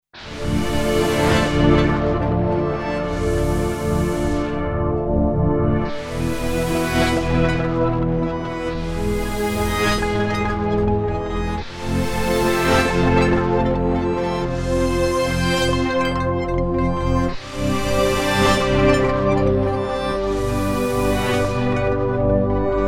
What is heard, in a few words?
pad fm, yamaha sy99; synth